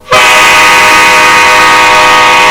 train

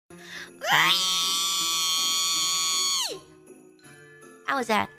reeeeee

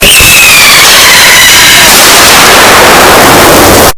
average firework

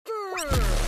lightning grrrrrr

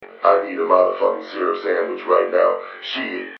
droid

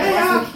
jaja

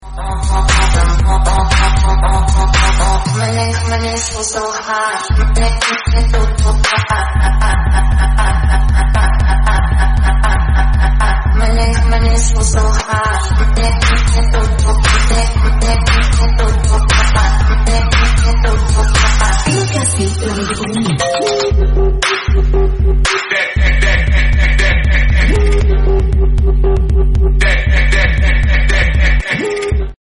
looping energic

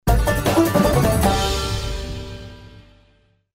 banjo riff

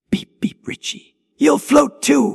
beep beep

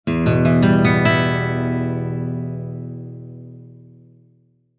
western standoff

